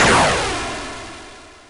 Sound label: missile rocket, sentry